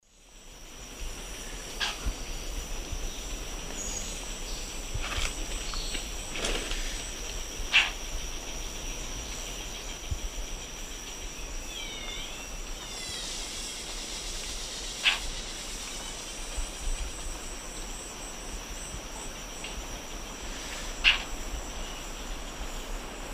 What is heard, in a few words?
Conopophaga aurita, calls male; S. America; Eastern Lowlands